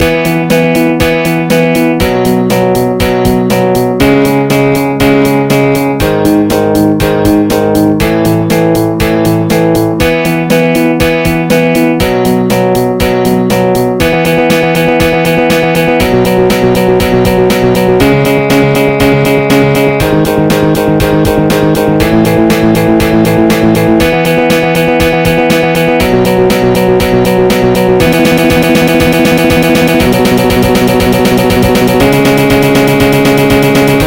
MIDI Test

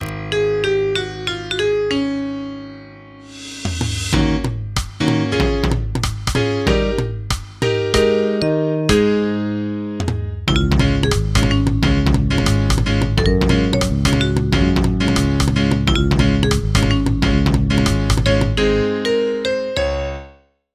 commercial background music; Empire Today

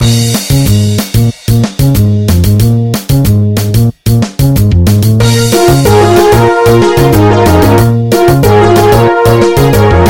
Victory Against an Opponent

